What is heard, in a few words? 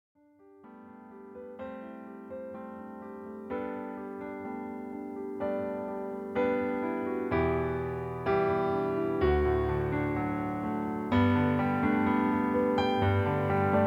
Holy Sopran